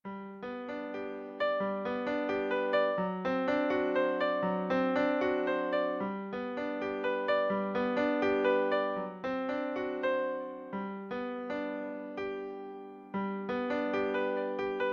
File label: Harp Song